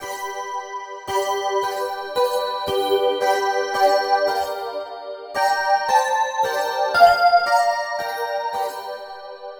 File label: Bell; Sonic Foundry (Sony Creative Software); Infinite Soul VOL-2; Bells